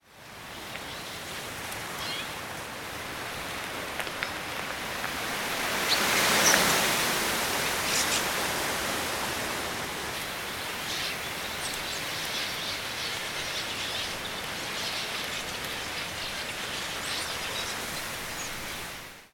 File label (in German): bergfinken